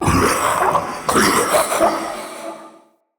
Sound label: Male Vocal Stand, Enemy; Mockery; Mockeries